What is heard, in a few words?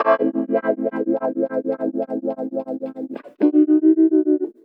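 guitar, Roots Funk; USB Soundscan vol.41; AKAI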